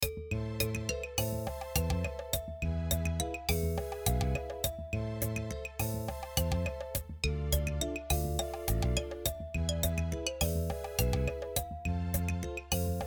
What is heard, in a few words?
panned glocken tempo 104